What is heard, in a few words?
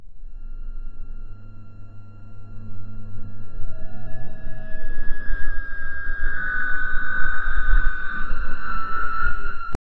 electricity shorting out